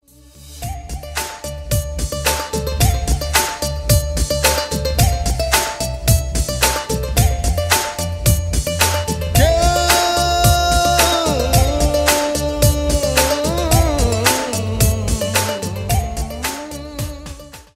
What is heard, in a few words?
melodious love ringtone, iphone ringtones